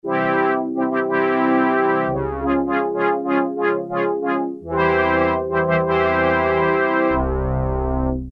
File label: Brass Ensemble, Roland Juno-106; Factory Preset